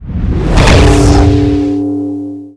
skill shot fire

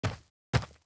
run; Monster; 철교삼

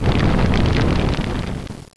USE FIRE, interface

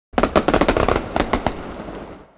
DUEL WIN

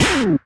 miss; interface; MiniGame

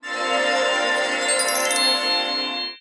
xmas

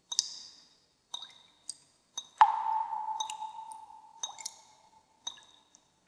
drop water; EnvSound

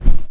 targeting

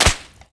leather bash flesh

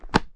DROP CHOP, interface

